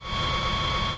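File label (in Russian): работа сверлильного станка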